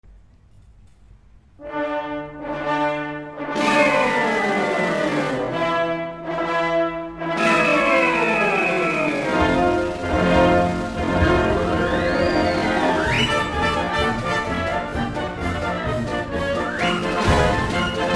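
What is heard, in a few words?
Circus March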